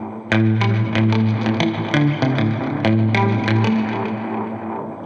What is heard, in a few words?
Strat Dub 95 A, Guitar Dub, 95bpm; musicradar; dub designer